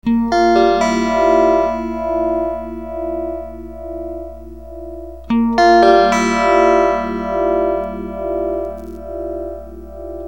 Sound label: phase 45; guitar - effect - sound card